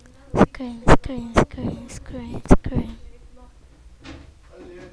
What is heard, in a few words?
scream